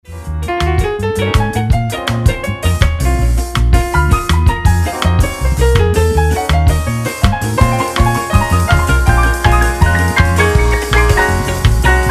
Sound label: ska jazz ensemble